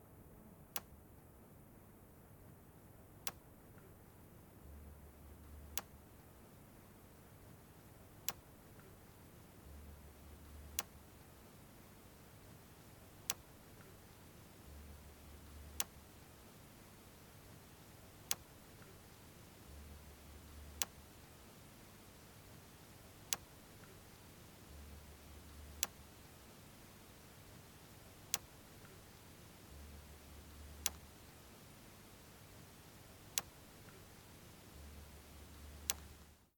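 Cliquetis Clatterings, Pot detection clatter, power control clatter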